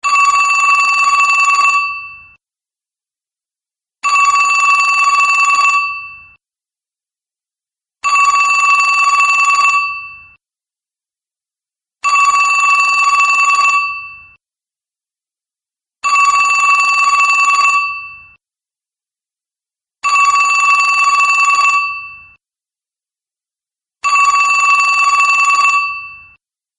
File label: Lobby Ring; Classic Rings And More